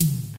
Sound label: nineties clear tom drum one shot e key, Royality free tom sample tuned to the E note.; tom drum samples